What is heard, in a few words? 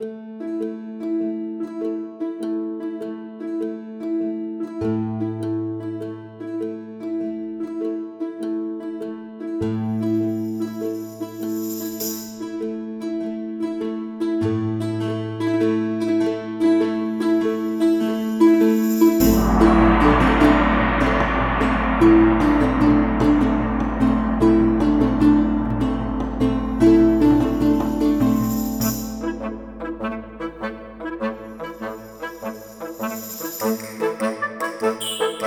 market, music; eldemoor